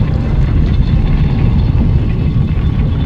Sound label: Sci-fi Door Loop; SFX Kit; Sci-Fi Loops